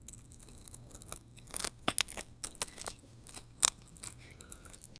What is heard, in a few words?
thud thud of pestle crack, alchemy